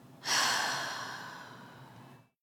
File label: Breath